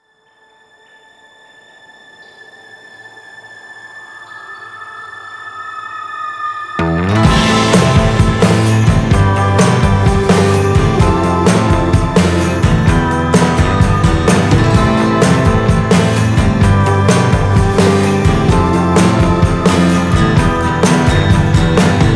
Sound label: Instrumental